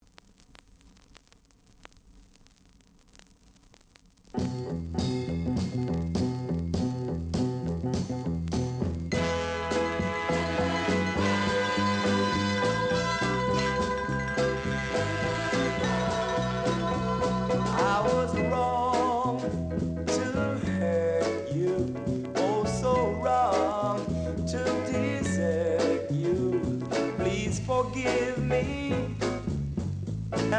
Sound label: groove